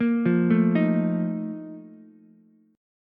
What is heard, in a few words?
Bb Major 7th Suspended 4th, Ukulele; arpeggiated; JGuitar